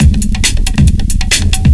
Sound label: LOOP FX, Big Beat Drumloops